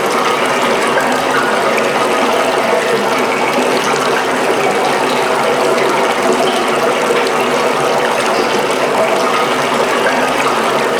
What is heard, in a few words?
Sewer Pipe Pool Loop